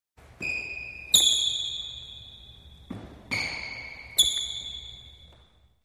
Multiple Sneaker Squeaks On Racquetball Court Floor